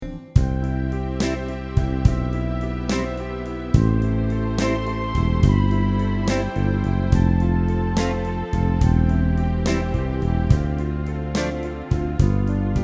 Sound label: 50s and 60s styles